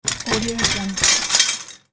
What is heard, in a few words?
bicycle bouncing down stairs; electronplanet